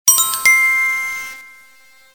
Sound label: store Success